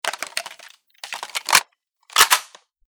ak load; ak9; weapon

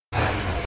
guardian Hit; customnpcs

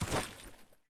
grass footstep

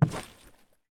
wood footstep

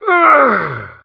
death, Human

